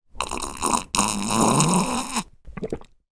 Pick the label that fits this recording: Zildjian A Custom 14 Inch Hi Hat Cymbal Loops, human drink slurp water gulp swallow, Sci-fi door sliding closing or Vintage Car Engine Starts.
human drink slurp water gulp swallow